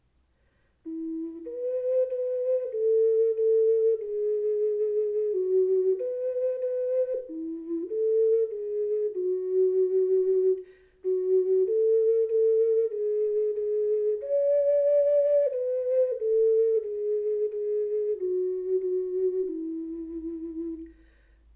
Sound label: Ocarina; Takacs; Wer hat die schönsten Schäfchen